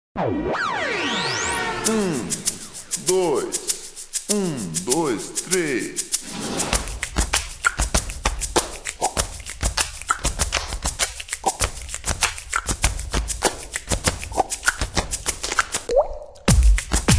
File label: Samba